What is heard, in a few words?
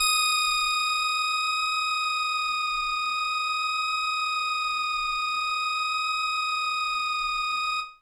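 Indie Organ Kawaii D#1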